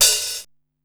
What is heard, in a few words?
HiHat Open; Korg Wavestation-SR; Drum Kit